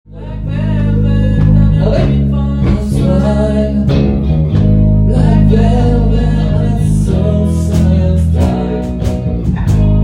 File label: 3 voix Tests